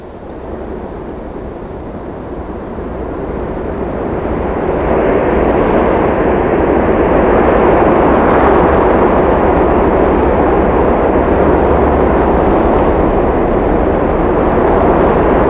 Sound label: env wind